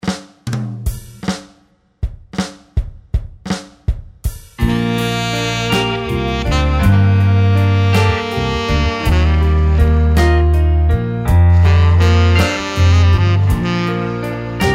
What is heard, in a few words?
Music background pads